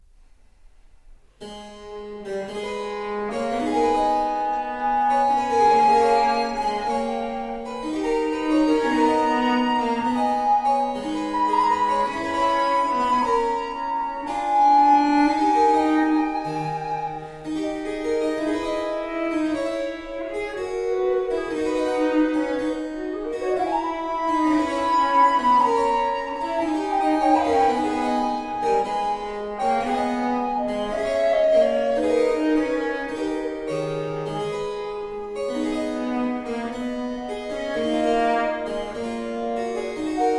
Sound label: Sonata II In C Major Largo, Recorder and Basso Continuo; Francesco Barsanti; Sonatas for Recorder and Basso Continuo